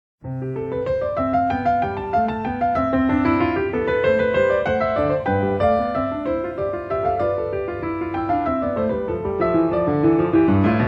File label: Three-Part Invention (Sinfonia) in C Major; Bach; No. 1; BWV 787